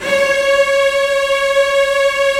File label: STR, Roland LA Composer; Roland - LA Composer vol. 4